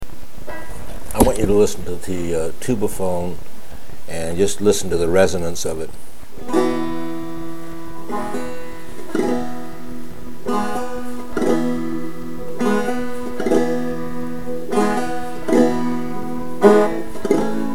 long neck, banjo

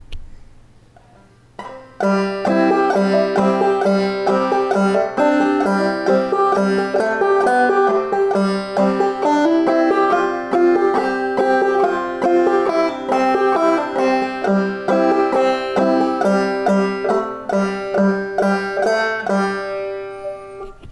3rd string, banjo

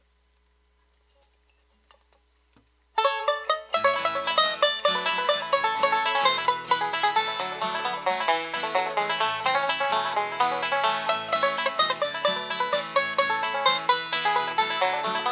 banjo

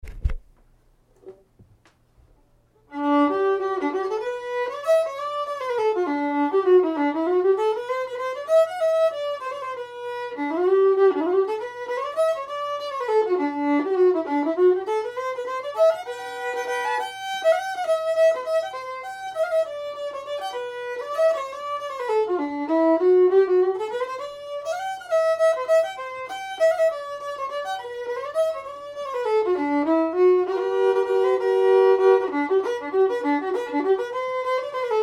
accordion

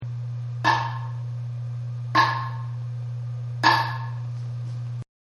tap tone, banjo

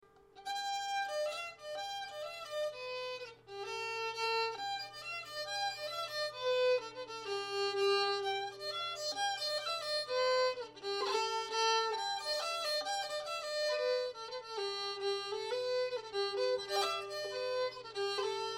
noise warn, fiddle

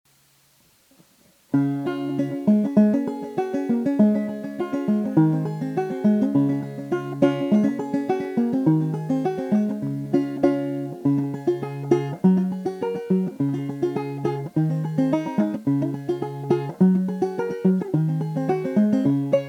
banjo clog

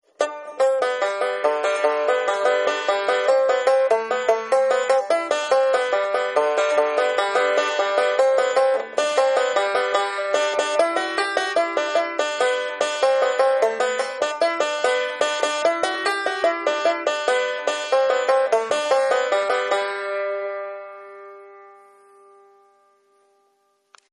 irish wash, banjo

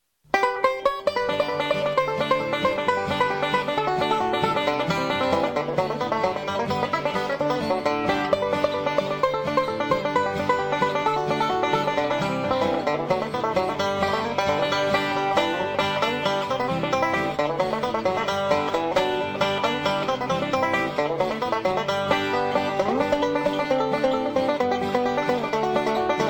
banjo

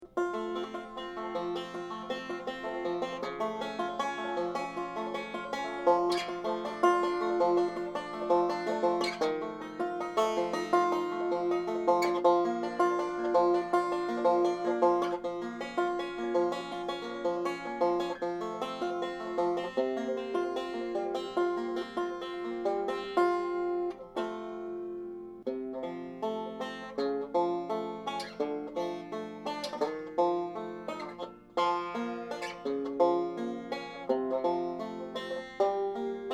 lullaby, banjo

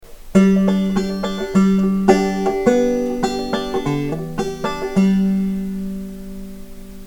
2 finger, banjo

